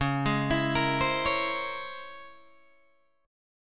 Db Minor Major 13th Db Guitar DADGAD; DbmM13 arpeggiated; JGuitar

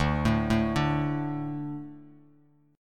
D 5th D, down, Bass Standard; D5 arpeggiated; JGuitar

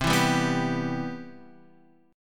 C Major 7th, Guitar Half Step Down

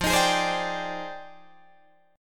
F Minor 13th, Guitar Drop D

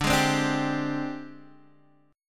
Ab Major 7th Flat 5th D, Guitar

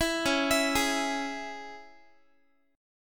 C sharp Minor down Guitar; C#m; arpeggiated; JGuitar

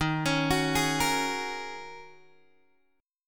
Eb Minor 11th Eb down Guitar Open G

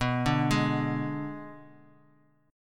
B Minor 6th B, Bass Standard; Bm6 arpeggiated; x,14,12,13; JGuitar

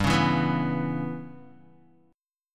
G 6th Flat 5th, Guitar; G6b5 strummed; JGuitar

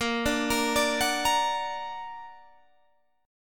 Bb Augmented Bb, Guitar Open G; 20,19,20,19,19,20

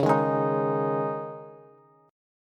Db Suspended 2nd, Bass; strummed

